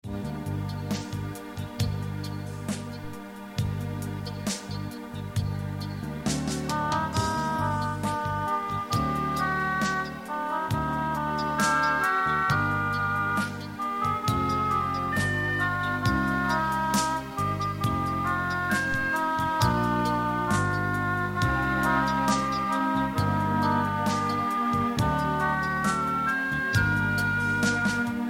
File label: melodiya; muzykalnaya skazka